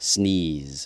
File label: sneeze